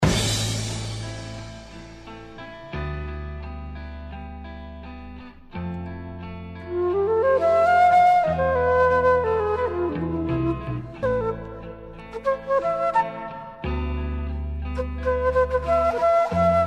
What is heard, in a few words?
the flute solo